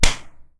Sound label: HIGH HIT, Anime Sound FX; COMIC